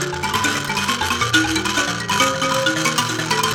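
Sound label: PLK Bass Mbira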